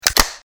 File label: switch; arena closer; weapons